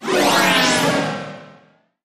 Energy Sweep

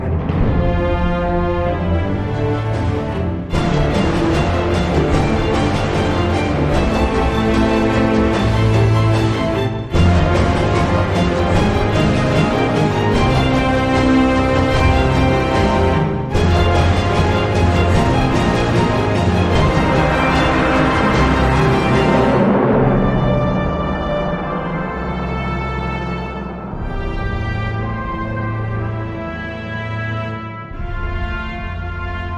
No Choir

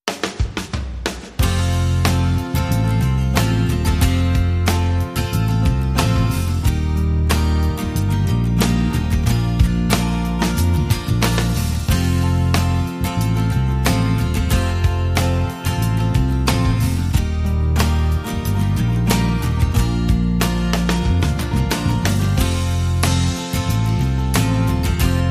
No Piano & No E Guitar

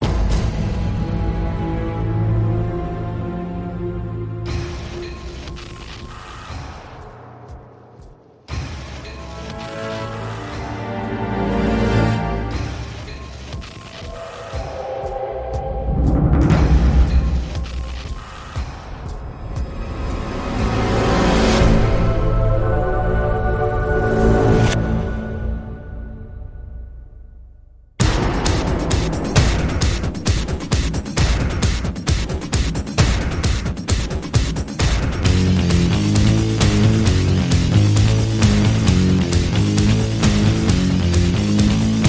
Industrial

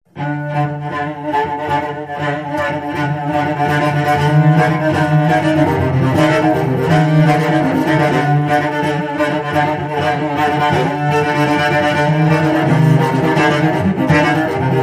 Cello Danger Excitement

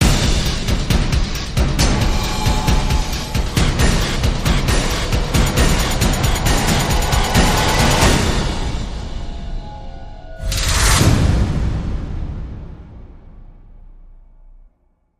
Drums

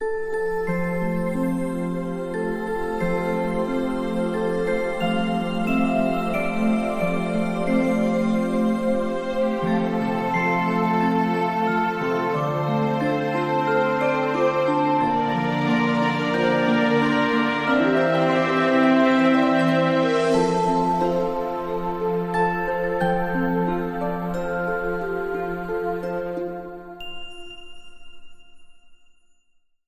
Sweet Dreams Lullaby

